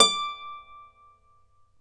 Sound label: Celtic Harp, Plucked Strings